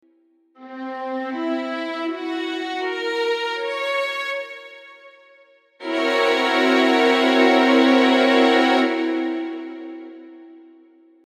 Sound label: chord 7b5b9